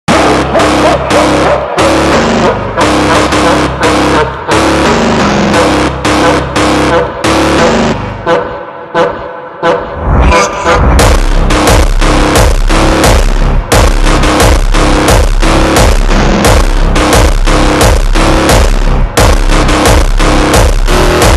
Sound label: ultra slowed reverb